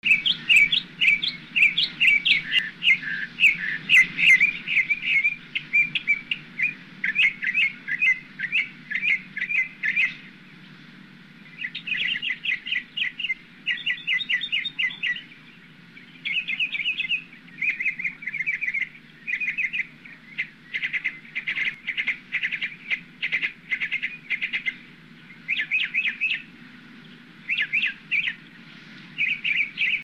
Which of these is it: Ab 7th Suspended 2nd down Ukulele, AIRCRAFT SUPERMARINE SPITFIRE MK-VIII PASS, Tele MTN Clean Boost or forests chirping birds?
forests chirping birds